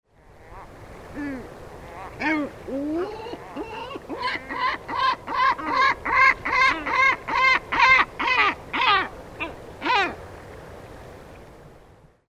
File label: great black backed gull